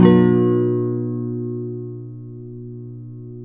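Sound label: nylon down major C; Spectrasonics; Hans Zimmer Guitars VOL-2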